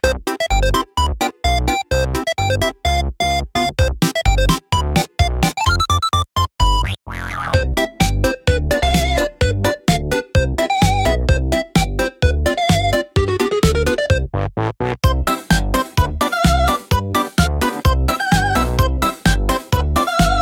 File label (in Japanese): アクション